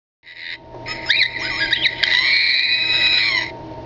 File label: r2d2 sound; ROTS